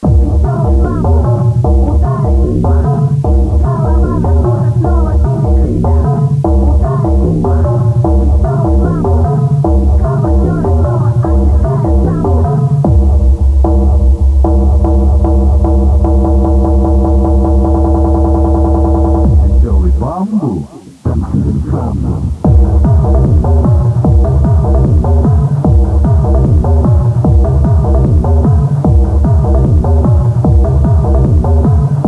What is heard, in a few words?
muz